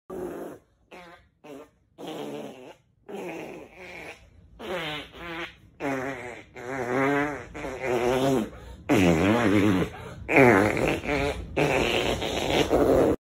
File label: Long Fart